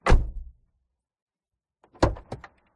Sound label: Door Citroen C2 Door Open Close INT DRIVER; DPA4060